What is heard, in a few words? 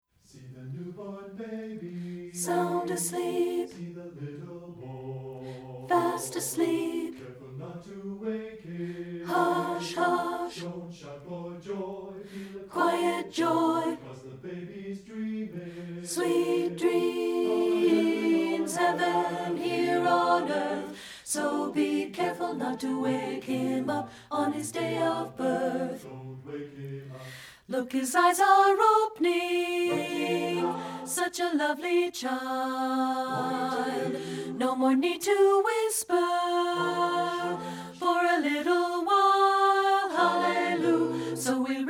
ALTO; satb